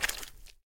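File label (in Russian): plesk vody ot prygayushchei na poverkhnost ryby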